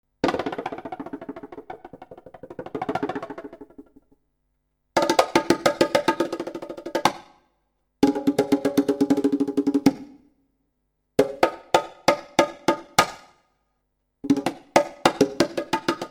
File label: bongo